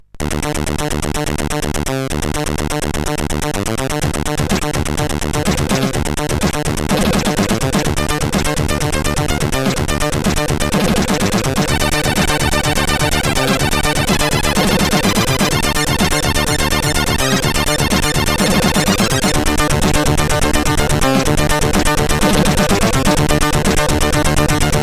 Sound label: Intro Music, CSG8580